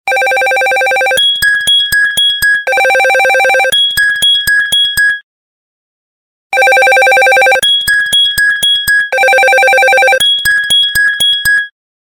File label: Alarmclock H T C